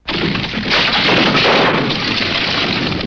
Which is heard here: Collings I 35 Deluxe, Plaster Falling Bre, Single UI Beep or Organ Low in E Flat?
Plaster Falling Bre